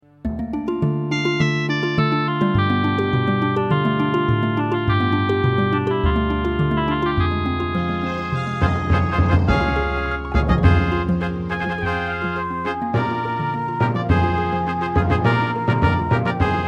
Terratec MiniWaveSystem WT64

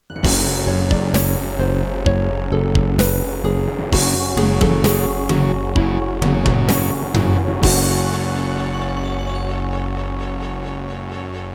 Introduction, Roland CM-500; Doom (Sigil)

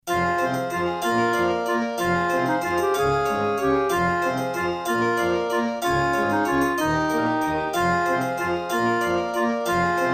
Roland SC-8820_88MAP; Death Gate

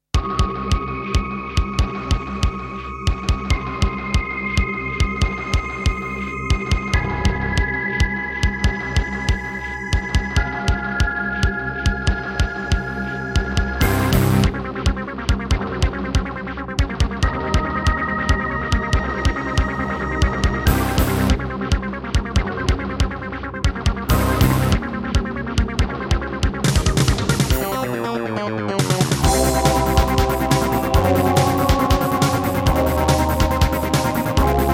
Roland SC-55; sigil doom episode 5

